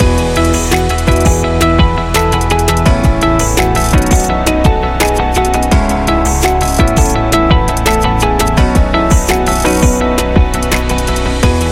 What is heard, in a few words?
Podcast Loop